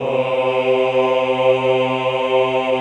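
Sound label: choir C2, Aaahh; Sound & Vision - Gigapack I CD 2 (Roland)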